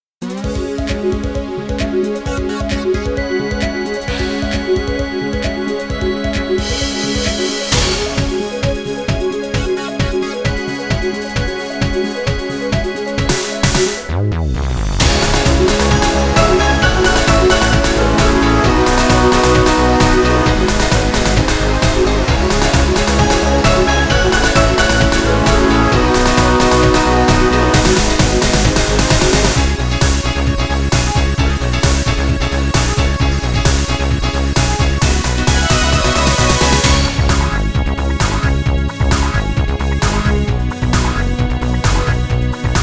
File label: inst